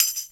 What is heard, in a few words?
SHAKERS; Best Service; Dance Mega Drums CD2